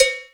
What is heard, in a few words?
cowbell C4; Big Fish Audio; Synth City